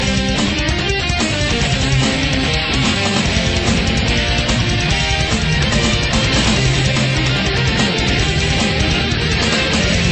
Metal; Contra

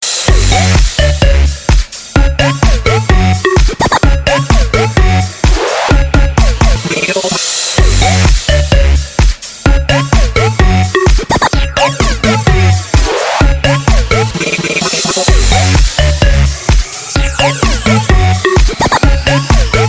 Beat Mix

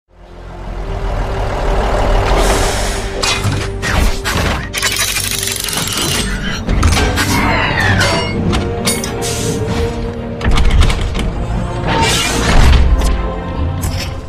Transforming Tone; meloboom

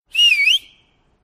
Cab Whistle

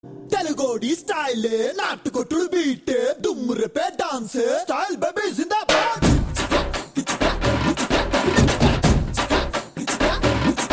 Telegu Style

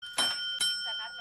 bell; Breaking bad

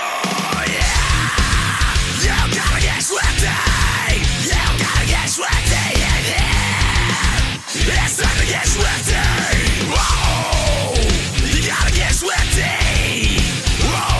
Metal

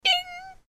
Ding; Jerma

